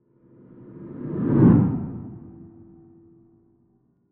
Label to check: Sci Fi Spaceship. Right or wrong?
Right